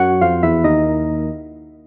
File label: Electro Loose Sound